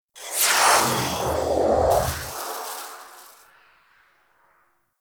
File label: Wisp Generic Idle; Warframe